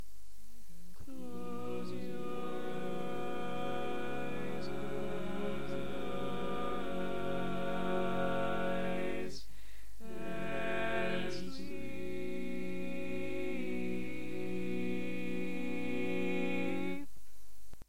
5 parts All Parts, barbershop tags

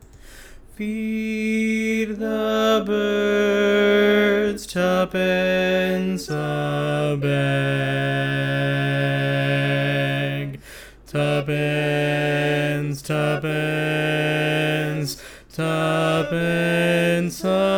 Bass, barbershop tags

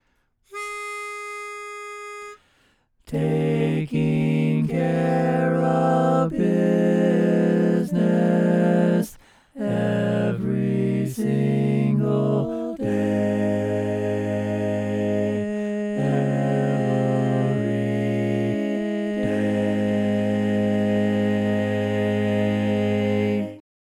Tenor, barbershop

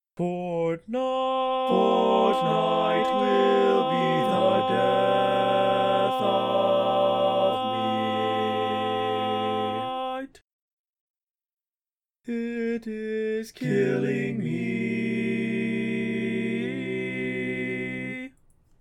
Tenor, barbershop tags

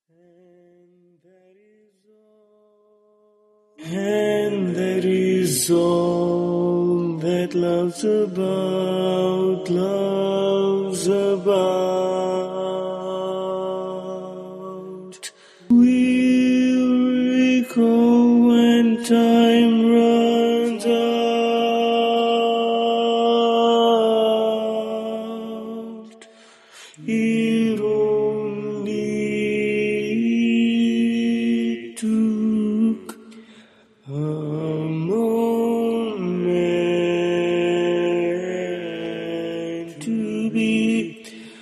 Bari, Barbershop Tags

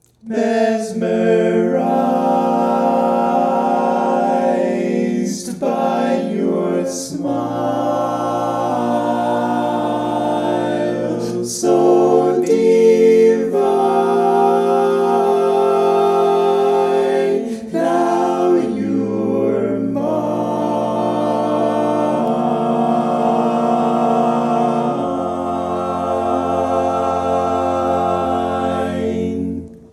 Tenor, barbershop

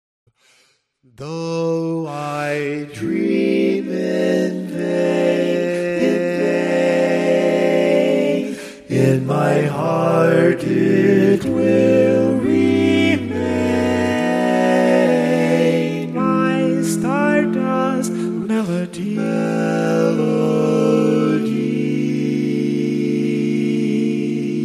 barbershop tags, all parts

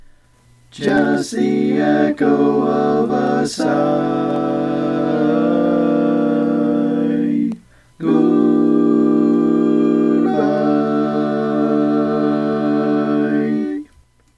All Parts, barbershop

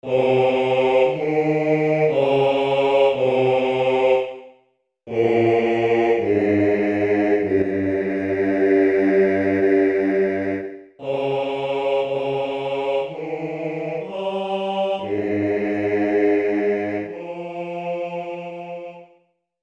Bari, barbershop tags

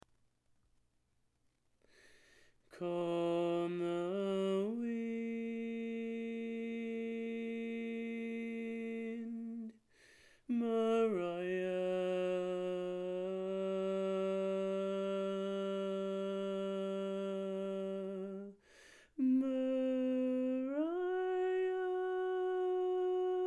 Mariah, Bari, barbershop tags

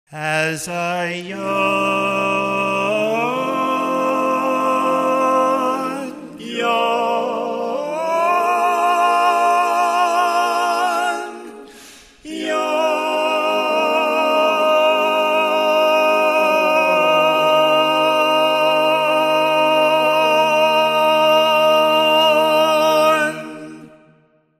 Lead, barbershop

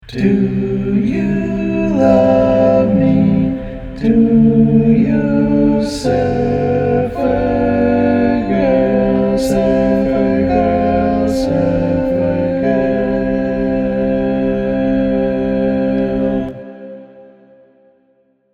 All Parts, Barbershop Tags, Lead hanger

